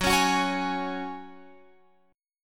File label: F sharp Suspended 2nd, Guitar Open F; strummed; x,13,13,13,16,15